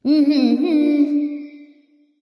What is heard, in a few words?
tongue light; smite; voicepack; hel